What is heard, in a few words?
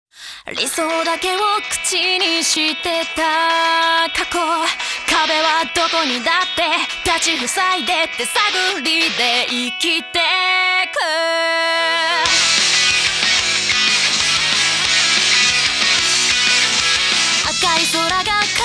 Acapella; Gintama